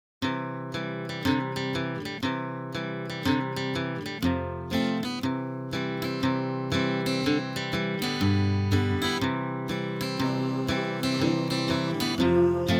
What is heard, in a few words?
brown bear song instr